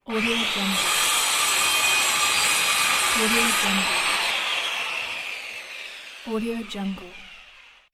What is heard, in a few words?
circular saw